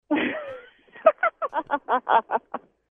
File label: musical laughter